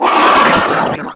slime jump; ghouls forest2; slimejumper

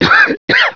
no air

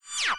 radio off

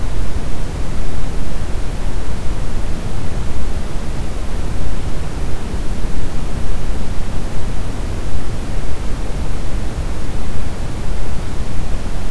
windy

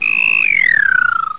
chum toad die

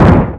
airplane

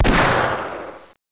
revolver

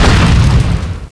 fire, weapons

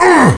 human pain

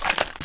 gun pickup

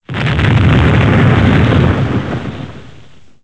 swoop; mr-satan

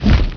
big swing; ghouls forest2; bigzombo

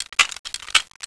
gun pickup

